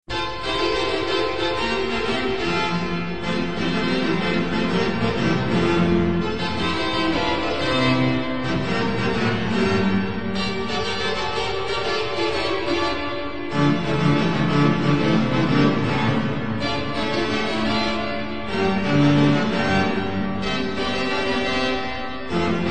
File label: Spanish Trumpets